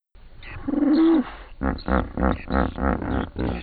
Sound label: Hippo Call